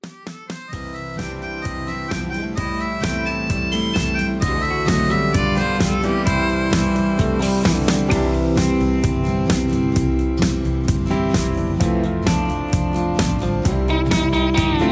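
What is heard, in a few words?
Instrumental without background vocals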